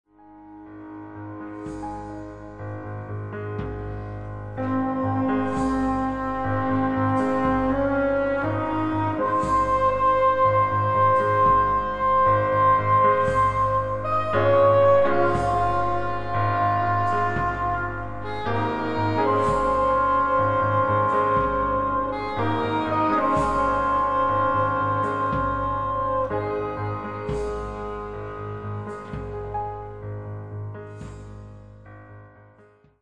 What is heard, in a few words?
victory theme